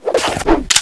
draw; ayakashi

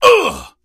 pain; st allies; darkwing

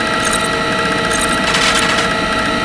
comp loop, ambience; poke646

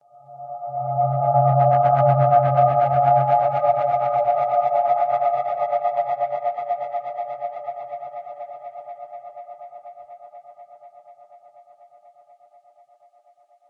darkness humming; st mission; hs c4

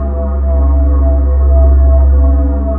spool hum; vendetta

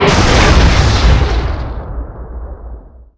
spawn; vendetta

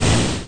ship; kyper kuutio

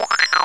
light switch; poke646

